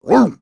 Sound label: bark, Dogz